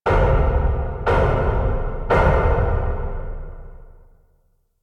Start Wave; Warframe; Entrati; Mirror Defense

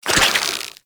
Gas Arrow Tether Attach; Warframe; Infested; Derelict Cernos Prime

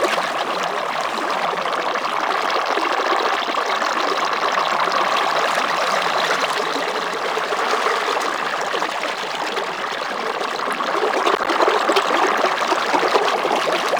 Sharkwing Movement Bubble Loop, Vehicles; Warframe

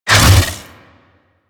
Hammer Shield Whoosh C; Crp Hammer Shield

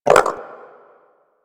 Wyrm Idle, Vocals; Warframe; Pets

